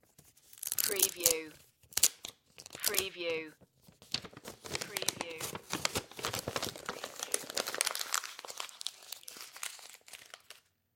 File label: envelope open and crunch